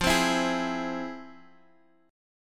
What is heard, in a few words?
F Minor Major 7th, F, Guitar, Half Step Down